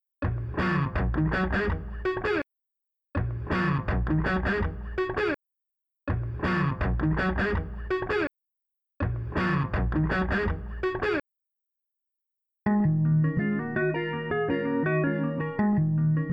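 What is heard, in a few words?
Guitars Dry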